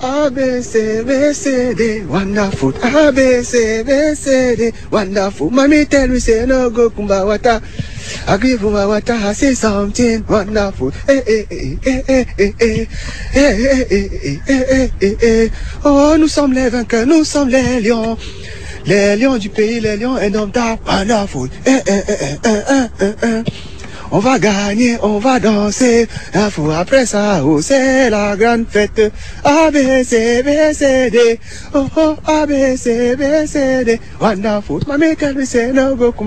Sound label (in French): Chant supporters camerounais